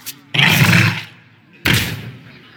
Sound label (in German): gegner ausspielen mit monster; hearthstone